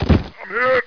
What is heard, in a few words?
bhit kevlar, player